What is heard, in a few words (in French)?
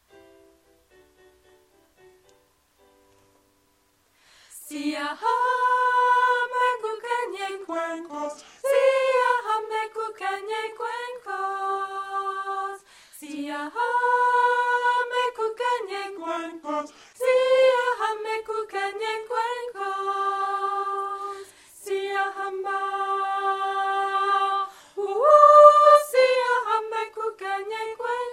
soprano VOIX; siyahamba